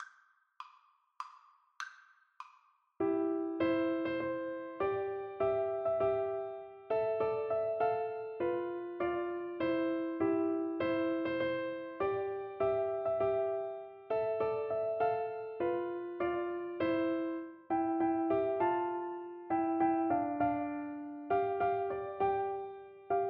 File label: Piano Duet